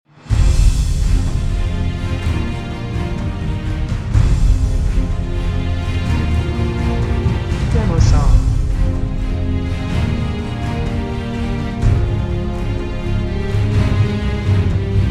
Tales of Adventures and Epic Battles Choir Trailer Edition